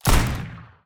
revolver

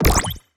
ui continue team

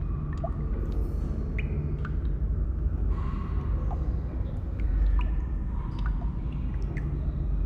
ice cave water drop

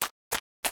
paw footstep

